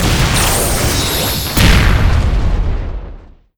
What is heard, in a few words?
Enemy Tail2 Active Meteor